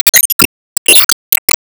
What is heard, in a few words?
Enemy Skill panel sealer Seal